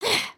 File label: sneeze